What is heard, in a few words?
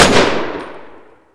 Inf Weapons; Red Orchestra 41-45